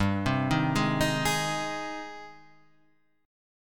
Ab Major 7th, chord

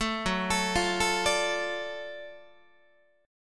Gb Minor Major 7th Sharp 5th, Guitar, chord; arpeggiated; JGuitar

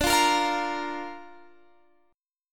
D Minor Sharp 5th D Guitar Drop D

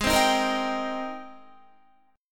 Ab Major 9th Ab, Guitar; strummed; 15,15,15,15,15,15; 4F,4A,5C,5G,5C,6E; AbM9; JGuitar

